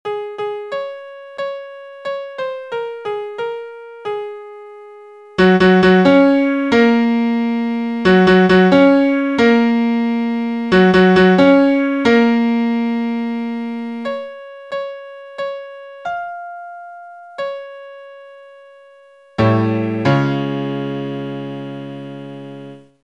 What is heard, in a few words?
Shofar Blessing